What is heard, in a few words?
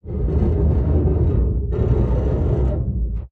damage vehicle; World Of Warships